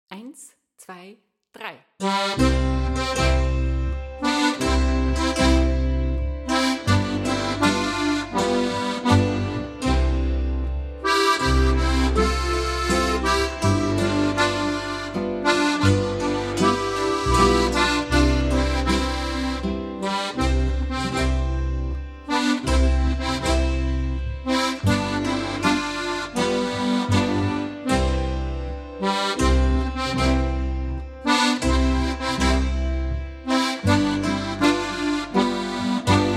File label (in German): O Tannenbaum